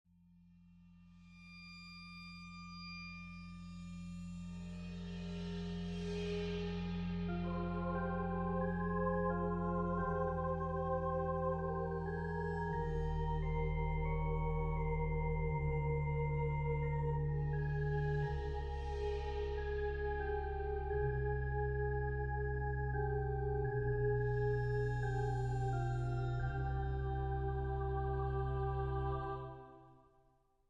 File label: Organ Choir